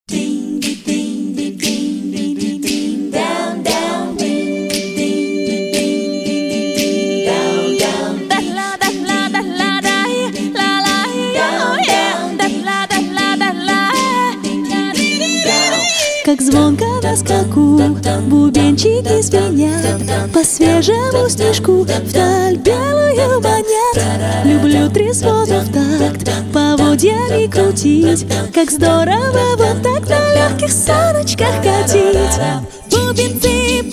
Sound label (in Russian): Acapella, Новогодние бубенцы